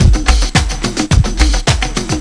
PERC LOVE LOOP

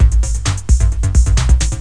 electro; Guitar&Electro

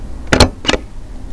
Stapler; Stapler 1.0

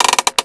bomb priming; Spearhead Demo; MacFormat 2003 June

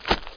step jungle; SpecOps2 MPlayer Demo

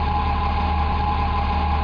wheel idle

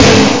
jam snare; Epic Collection 3, The; Epic Marketing; muzak

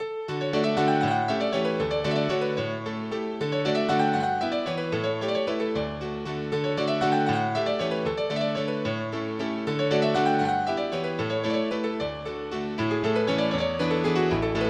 mazurka

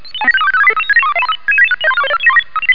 PRINTER; TIMECARD

